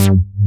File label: TECHNO; Pro-Rec - Synthsonic; Z1 TECHNO